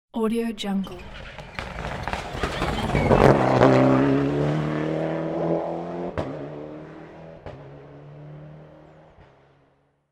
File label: fast racing car on curve